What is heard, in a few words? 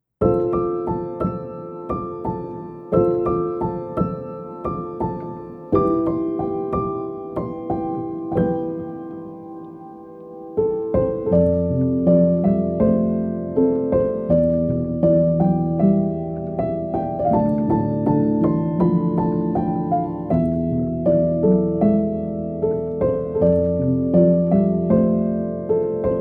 sleep piano; My Neighbor Totoro